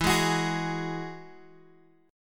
E Major Add 9th, Guitar Open F; strummed

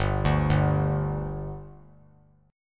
G 5th G, chord; G5 arpeggiated

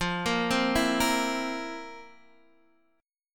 F Major 7th Suspended 4th, Guitar, Half Step Down

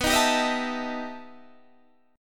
E Minor Major 11th B, guitar

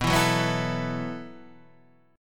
E Suspended 2nd Suspended 4th B

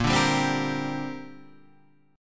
A sharp 6th Add 9th, Guitar Half Step Down; A#6add9

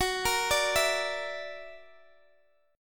Gb Augmented 7th, Guitar Open D; chordshape; down

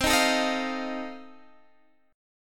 F 7th C

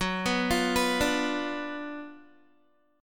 Gb Major 7th Suspended 4th Sharp 5th, Guitar; arpeggiated; JGuitar

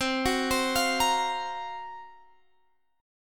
Gb Major 7th Flat 5th

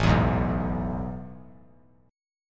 A Major 7th Suspended 2nd, chord; AM7sus2 strummed; 9,11,11,9; JGuitar

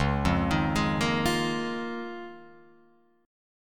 Db 6th Add 9th Db down Guitar Open F; Db6add9 arpeggiated; JGuitar

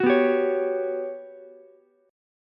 G Major Sharp 11th G down Ukulele C6; GM#11 strummed

